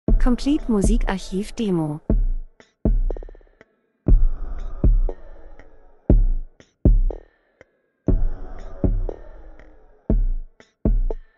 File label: drums; Elektro Pop Gleichförmig kalt getragen spannend wiederkehrend